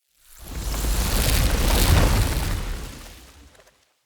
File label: Root Door Open B; Neathuns